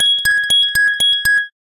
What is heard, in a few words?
Alarm Ding; Asus Padfone-2; alarms